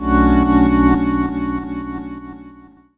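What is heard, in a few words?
Pad Synth; Equipped Music; Loungin House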